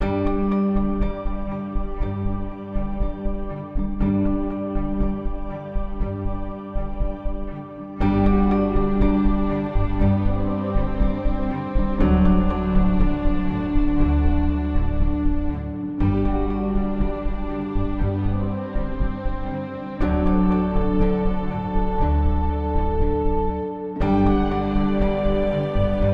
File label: Delightful Ambient